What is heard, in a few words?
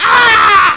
bawler, Human